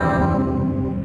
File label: Windows Startup; Windows Never Released Soundpack 2